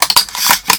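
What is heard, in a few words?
Drobovik Reload, shotgun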